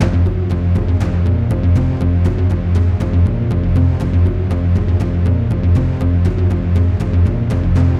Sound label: multiline ambient; DraculasCastleWD; wazdan